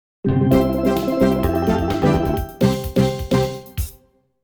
Victory; Twinkle Star Sprites

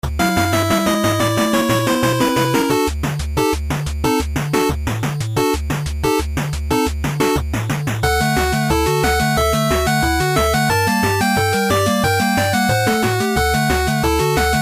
Battle; android; bgm; Slime Star Asobox

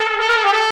trumpet danger; Left 4 Dead 2

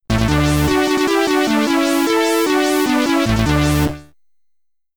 Stage Result, SID Stereo

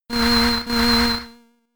Bug Buzz; attack moves; Pokemon; Gen 4; DPPL HG SS

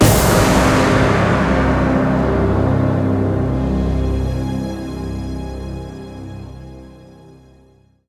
Chopper Action End; Scourge Outbreak

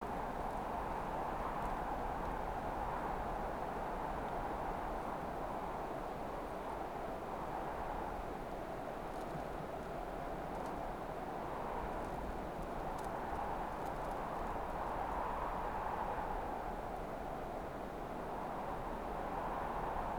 amb; HUB; Nepal

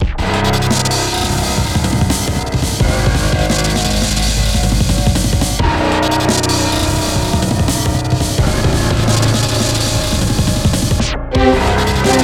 boss loop 172bpm; chicory a colorful tale; ps4

